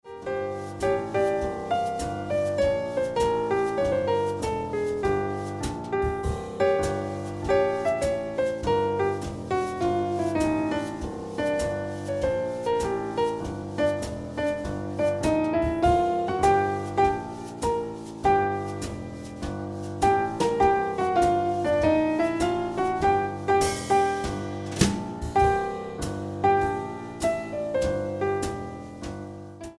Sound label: Piano Music